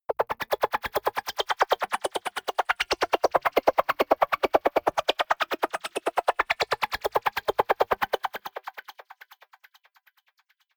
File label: delay gater